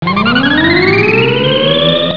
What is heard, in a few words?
Alarm